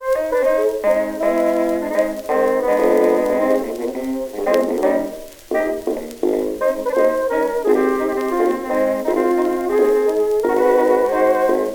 jukebox blues

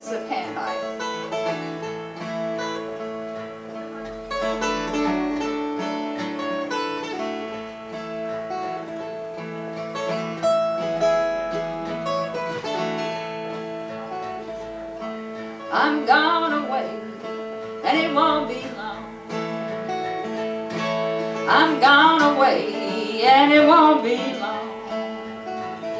musicbox

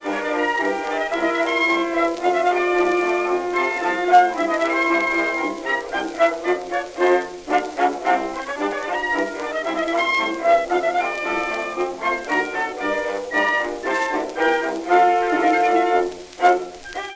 Jolly jingles, jukebox blues

